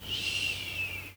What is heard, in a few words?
human snore out; theodyssey